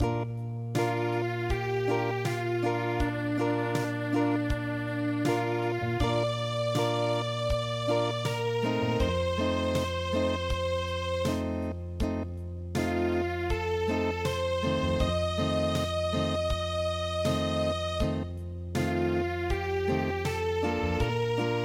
Acoustic, traditional; EVENING CHIMES

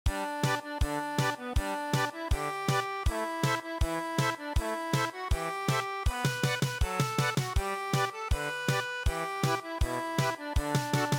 German Polka

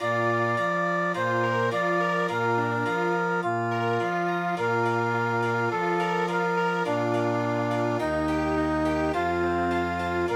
Chamber Music

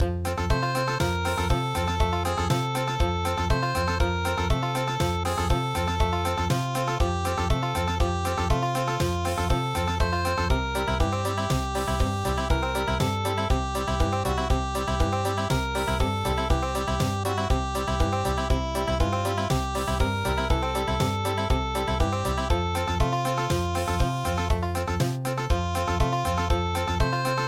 Country

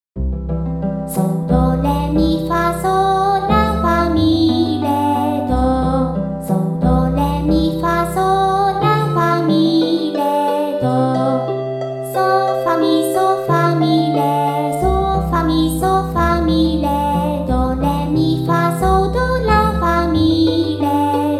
pygmy standing forest

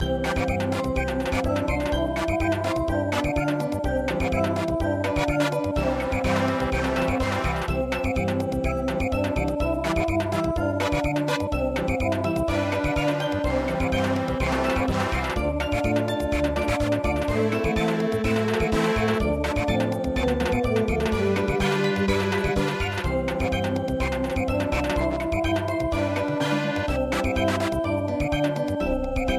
Samba

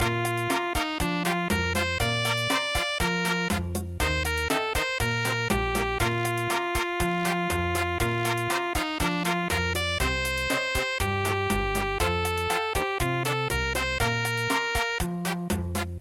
ChaChaCha, midi

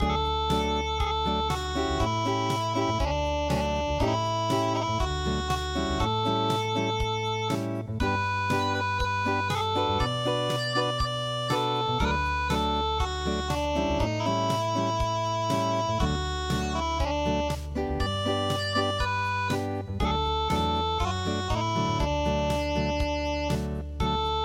Unplugged

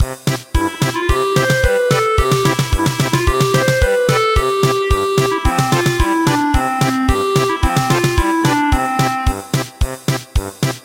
German Polka

